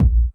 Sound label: Drum Machines, Korg Triton, Under Hard Kit